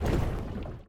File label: shoot; Mindustry; Retusa